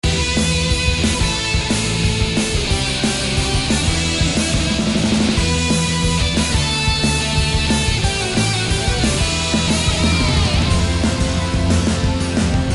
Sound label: Music Battle; hoshi eleven top soccer rpg android